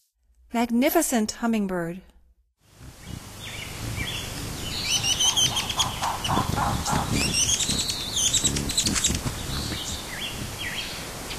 Magnificent Hummingbird, Songs of Birds of North America; Stokes